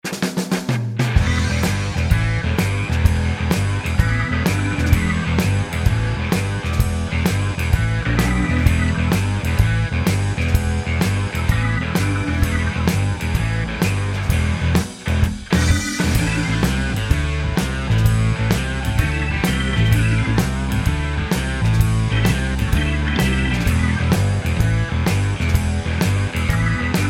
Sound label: Blues; Pulp